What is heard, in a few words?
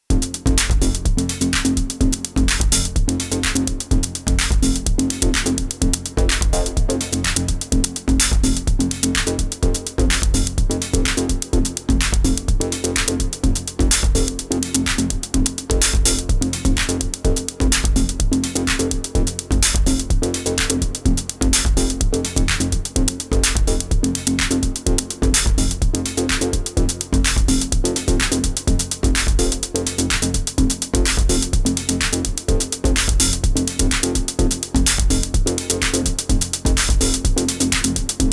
Techno System